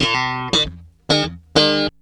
GUITAR, Funky GtrLicks; E-MU Formula 4000 Series Vol. 1; Hip Hop Nation

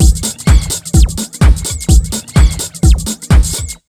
127 BEAT, Fresh Disco House; USB Soundscan vol.20; AKAI

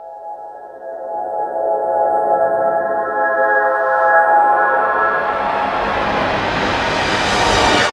Pad Moodz; Zero-G Jungle Warfare 1, 2 & 3; 90 s Sample CDs

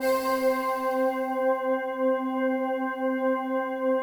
PAD, DIGI-PADS

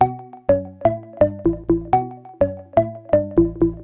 Organ chops (125BPM), retro house

